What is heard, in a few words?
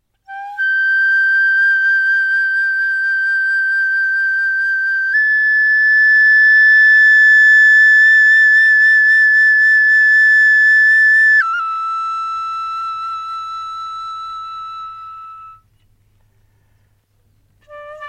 bansuri